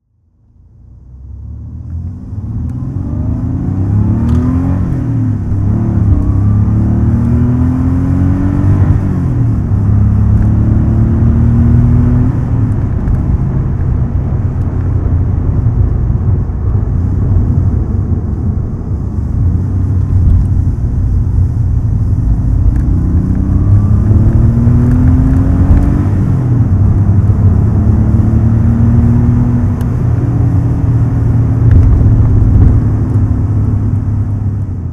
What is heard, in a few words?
porsche 911 964 C4 coupe driving